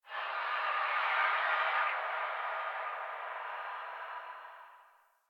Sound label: Landscape Distant Creatures Low Elevation; One Offs; Warframe; Venus Open World